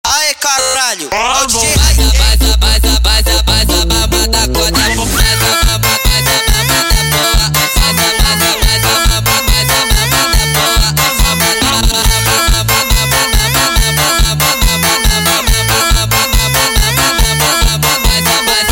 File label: Funk sped Up